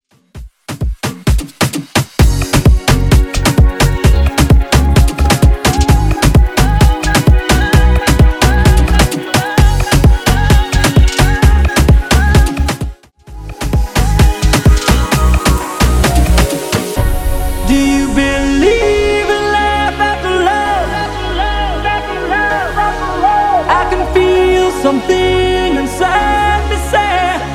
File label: Afro Bootleg